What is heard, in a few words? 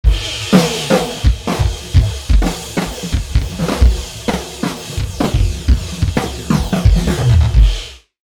drums fx